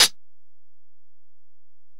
Hit, Closed Hats

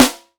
snare roots kit

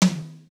percs

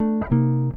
gtr; gtr 06

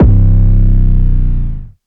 808 - C; Cymatics

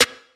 snare, Snare

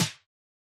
Late Snare; Snares

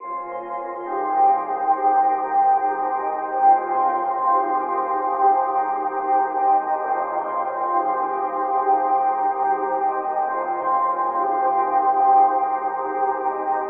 Melody Loop 140 BPM F Min; Cymatics - Astro Roundabout

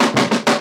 snare roll, 808; Snares

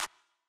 Claps

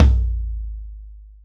Tom

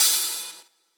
Wan Ohh, Crashes & Cymbals

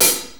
HiHat, closed hats

